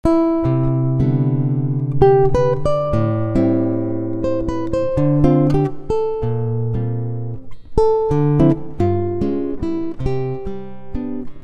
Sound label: Waltz